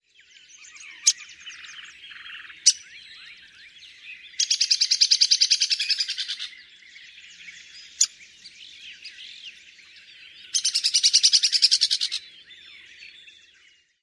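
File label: peek and sputter calls; TX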